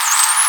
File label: Whistle